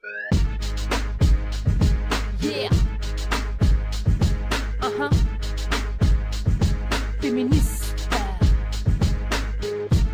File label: jingle feminist